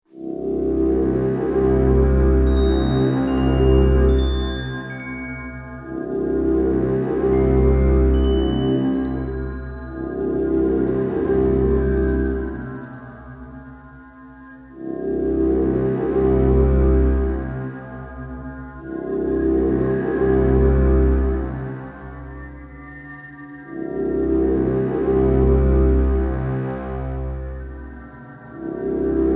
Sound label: Boss Defeated, Music; Metroid Prime Hunters (Surround); Alimbic Cannon